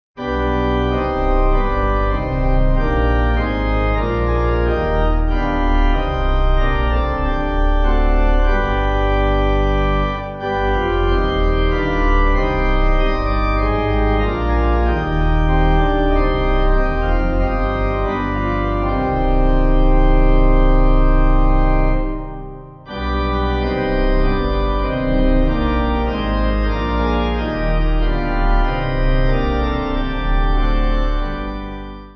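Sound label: Pipe Organ; Alone Now Going Forth; Bangor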